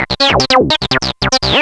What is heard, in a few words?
ring